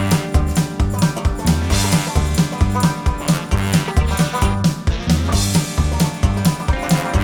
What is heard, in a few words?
Theme music